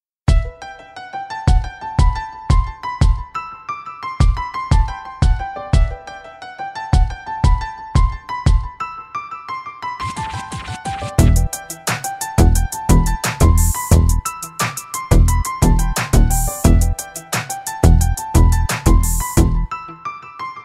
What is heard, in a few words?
karaoke instrumental